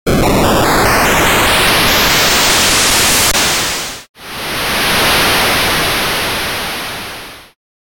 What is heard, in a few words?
Synth BitNoise Volume; Metalthrix; QiTaNo